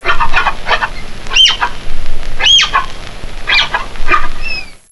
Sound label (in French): tapir cri